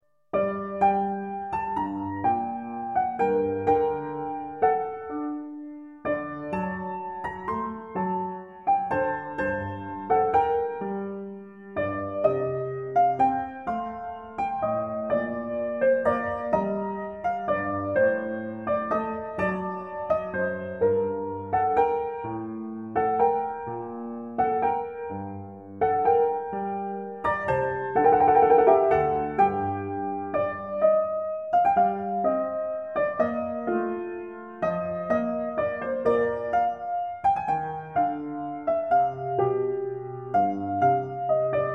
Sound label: Trio Sonata; Telemann; TWV42-d4; Essercizii Musici